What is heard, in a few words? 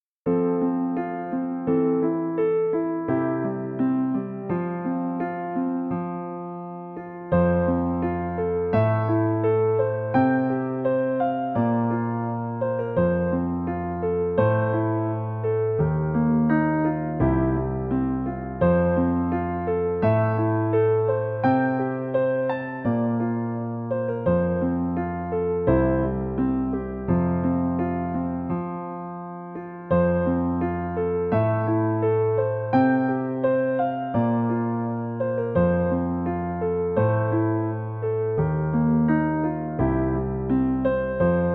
steingraeber piano; Modartt; Pianoteq